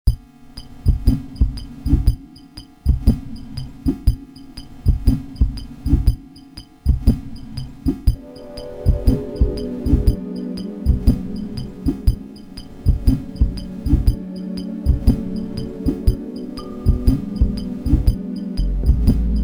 Instrumental

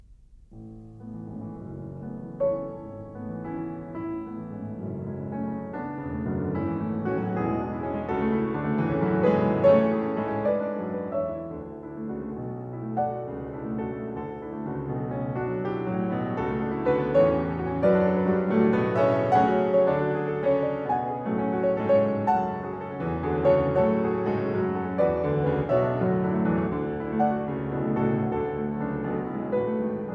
Prelude in A major, piano; 24 Preludes

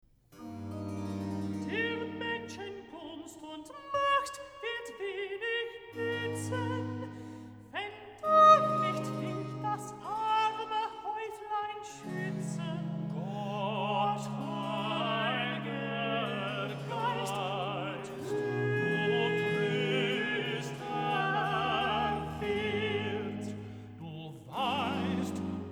Recitative; Bach - Cantatas Volume 34; Bach, J S; Erhalt uns, Herr, bei deinem Wort, BWV 126; Der Menschen Gunst; Alto

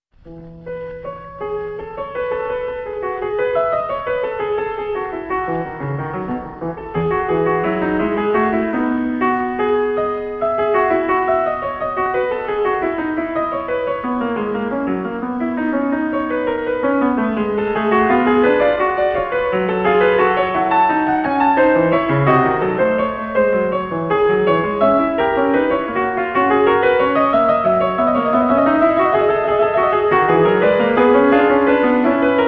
Clavicembalo, Prelude In E; Clavicembalo ben temperato Vol 2 Prelude 9 In E, BWV 878; J S Bach

